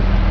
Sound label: truck, ambience